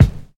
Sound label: clear kick drum single shot c key